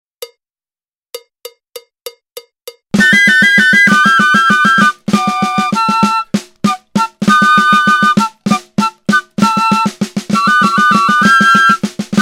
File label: flute